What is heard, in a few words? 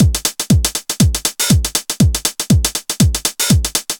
909 Loop; From Mars